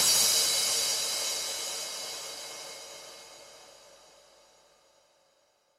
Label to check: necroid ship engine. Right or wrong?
Wrong